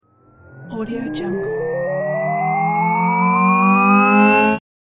charging up

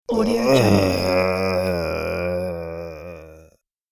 zombie groan

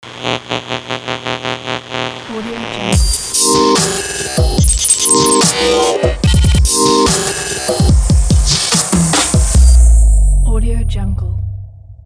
hitech logo

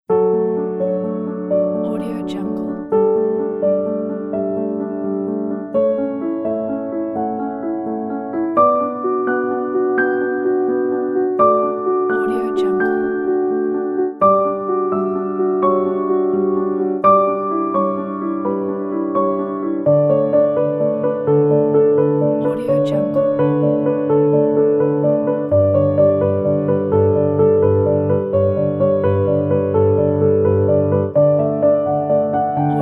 inspiring winter solo piano

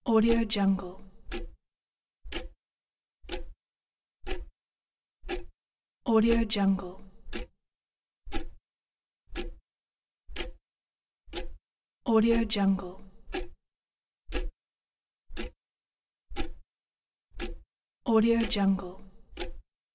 ticking clock

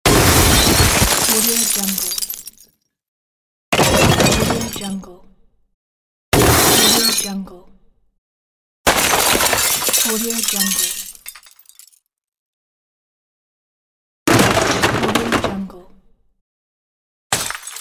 crash

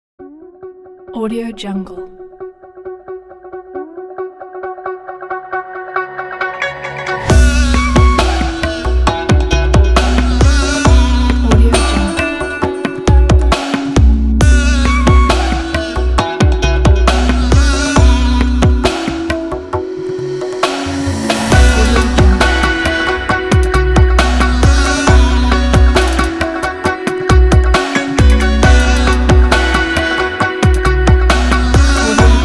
east trap; nitalyni

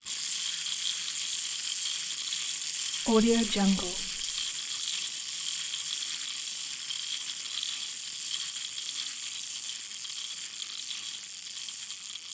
toy glasses spin; prosoundfx

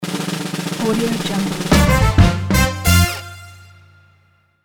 game show brass jingle

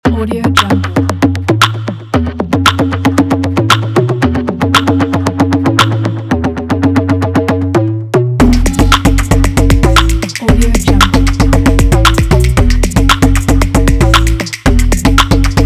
technology fashion energetic background

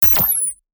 impact small needle beep; robotic lifeforms 2; soundmorph

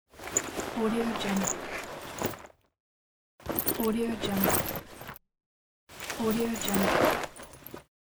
bag opening

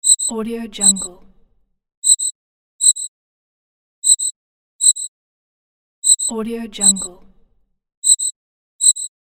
cricket chirping loop; floraphonic

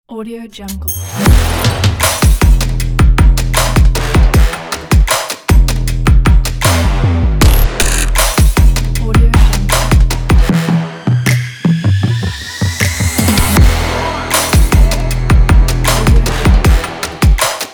trap

